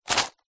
purse pouch leather containing coins and notes single shake; foley